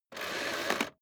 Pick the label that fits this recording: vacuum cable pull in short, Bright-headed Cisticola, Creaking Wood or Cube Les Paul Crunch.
vacuum cable pull in short